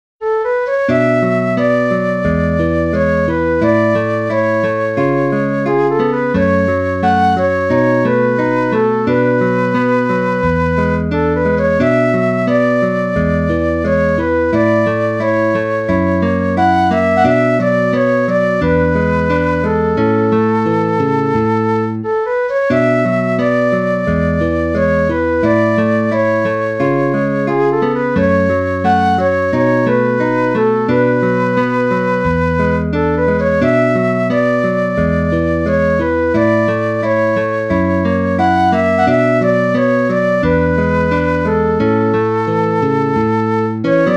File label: Flute Guitar Duet in A Major